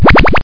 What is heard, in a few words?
beep